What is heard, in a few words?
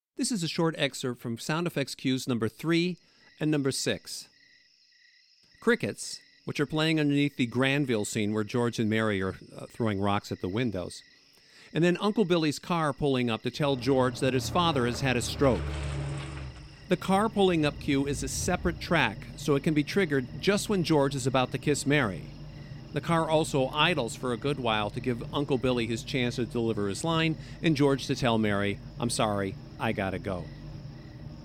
Crickets and Car pulls up; FX-03 Crickets and FX-06 Car pulls up; It's A Wonderful Life!